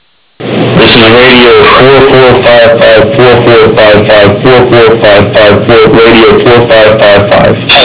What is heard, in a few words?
radio